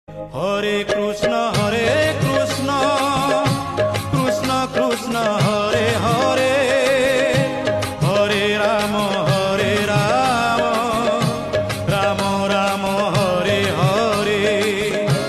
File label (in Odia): hare krishna hare rama odia ringtone